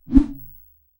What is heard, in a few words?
heavy sword swing soft; action game